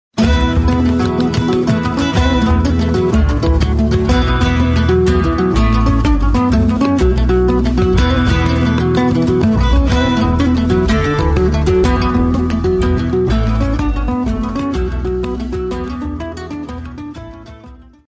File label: hot anvil, guitar